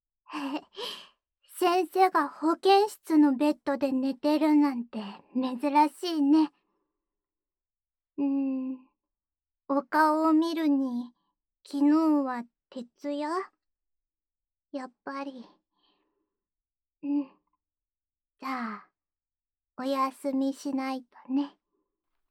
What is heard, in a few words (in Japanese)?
安眠用フェラチオループ, ASMR